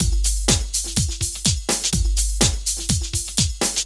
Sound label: Drum Loops